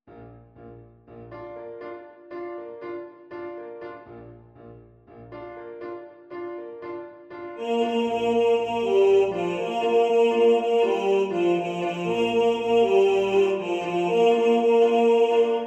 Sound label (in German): Bass 1 im Vordergrund, Männerchor